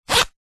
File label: wallet coin compartment zipper fast zip; foley; zapsplat